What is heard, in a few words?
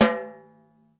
tuned tight, snares; single hits